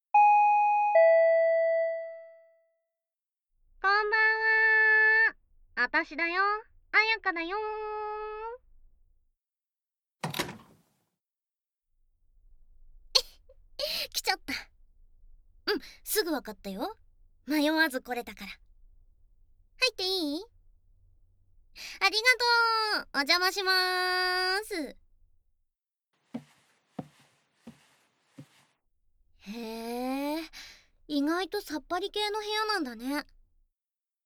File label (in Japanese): 癒しのまったり耳かき; ママ活JKに甘やかされたい♪～ギャルJKカノジョと濃厚囁き童貞卒業えっち♪～